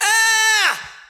"ah"

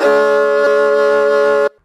violins; String

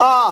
"ah", Voice; ooh ahh

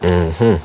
mmm hmm, voice; ooh ahh

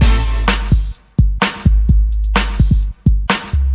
pop that, Drum loops Electro

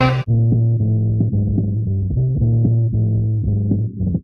bass horn hit first, Loops Phonky

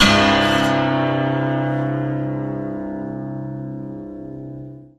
trebb, Guitar; Notes

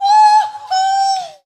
hoo hoo SUNG; ooh ahh; ho hoo woo

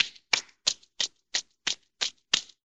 clapping

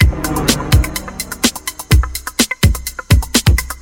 Drum loops, Electro

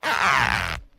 fart squeaky short

